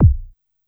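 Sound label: A1 Analog Bass Drum Dance, Yamaha PSR-E323 Dance Kit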